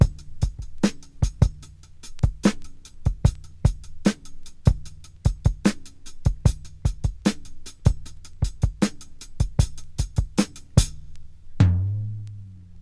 75 bpm drum groove g key, Free breakbeat sample - kick tuned to the G note. Loudest frequency: 559Hz; drum loop